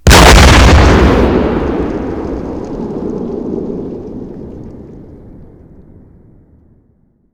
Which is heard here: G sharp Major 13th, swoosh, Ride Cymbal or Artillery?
Artillery